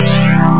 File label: string